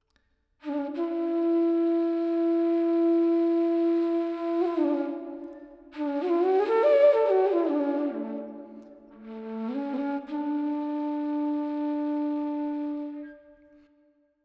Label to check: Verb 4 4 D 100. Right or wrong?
Right